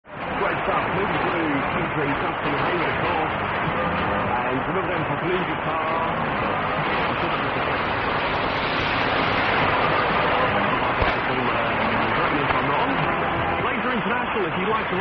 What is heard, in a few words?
6285 kHz